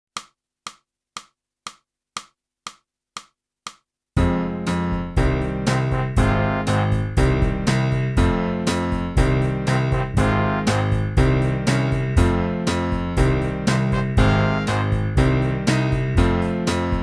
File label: clarinet; boosey method